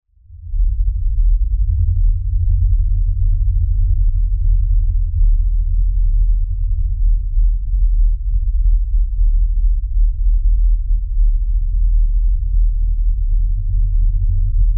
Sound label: Lfe Drone Low Bass Rumble; Drones; Blastwave